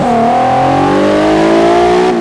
second, vehicles; gmod; tdmcars; gtr

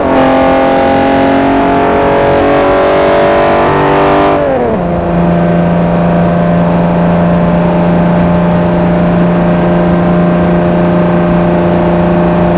fourth cruise, vehicles; gmod; tdmcars; s60

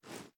reload xmag arm; gmod

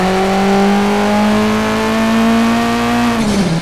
third, vehicles

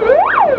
firetruck, els

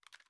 inspect; gmod

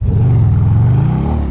first, vehicles; gmod; tdmcars; gtav; police b

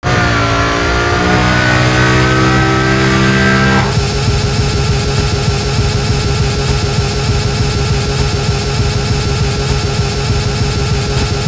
throttle back on, vehicles lambo2

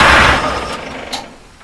shutdown, vehicles

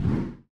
stop, vehicles; tahoe

